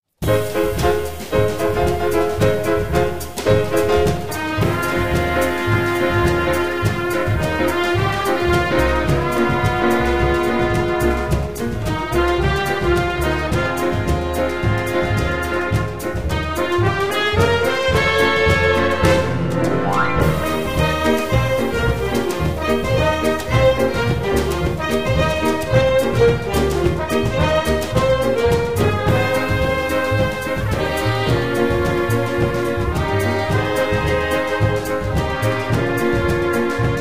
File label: Samba